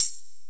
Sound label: Korg x5, Drums